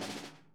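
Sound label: snare buzz; Sonic Reality; Interactive Drum Kits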